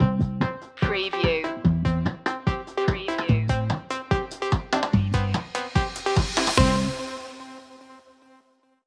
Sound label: stinger